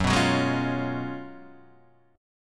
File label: Gb Major 7th Suspended 2nd Suspended 4th, Guitar Standard; GbM7sus2sus4 strummed; JGuitar